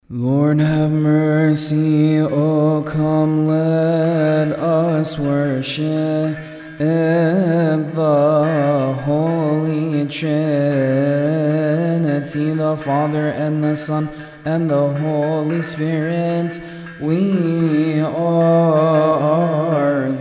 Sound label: intro for verses of cymbals English